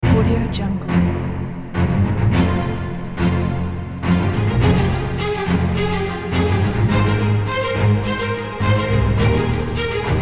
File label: classic heroic opener music